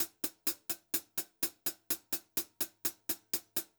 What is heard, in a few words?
Pop-Rock Drumloops; USB Soundscan vol.37; AKAI